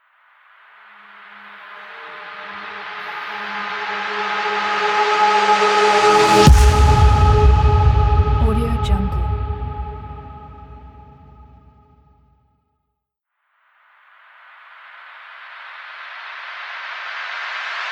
impact logo

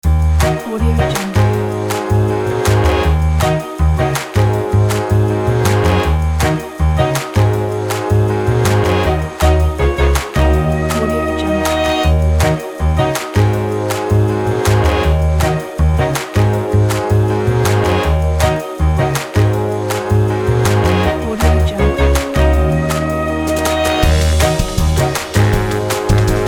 fun retro holiday

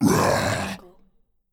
horror ghoul monster moan

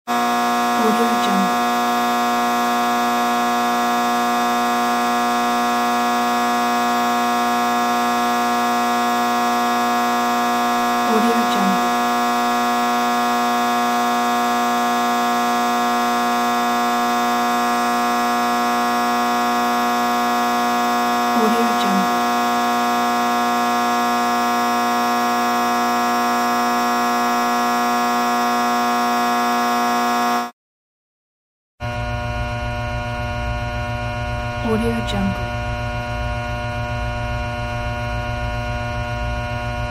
electric transformer

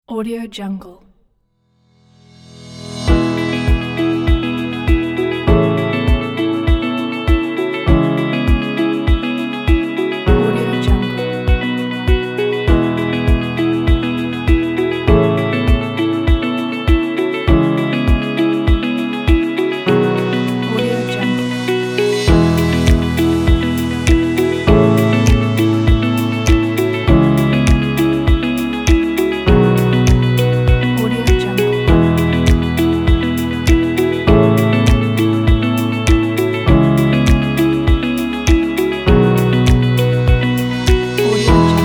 calm corporate music

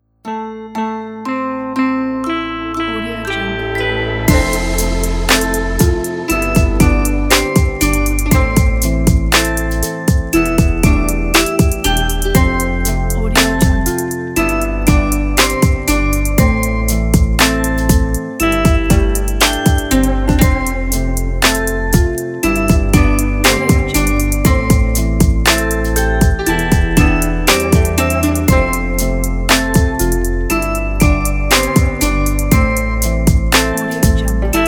chinese hip hop; fishermann

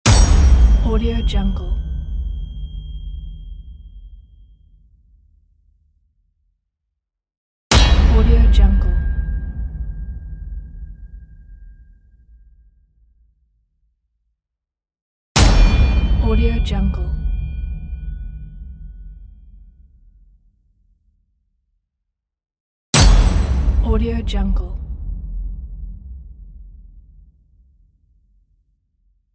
cinematic hit explosion; capeaudio

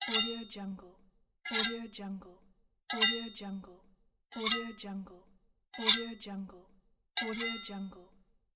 knife

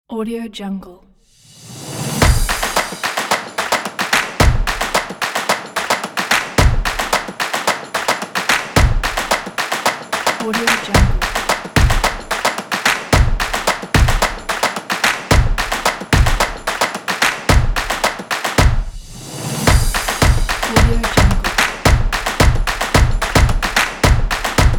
the drum on

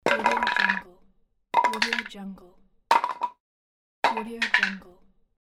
wood falling; mrsoundfx

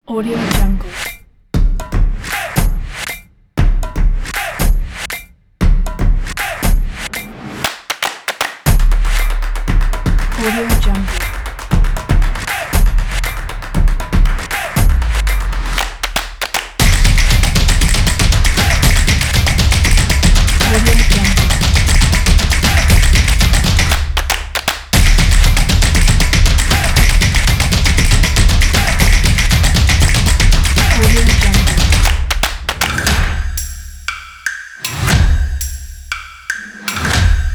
the clap

